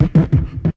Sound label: lofi vox break, BeatBox Male